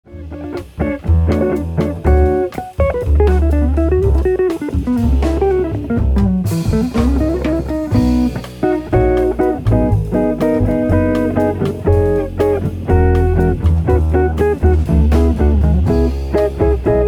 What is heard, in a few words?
Bossa